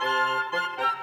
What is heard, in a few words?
Winds, Orchestral; Sonic Foundry (Sony Creative Software); Orchestral VOL-2; Modern 31